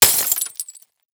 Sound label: Impact Glass Smash On Cement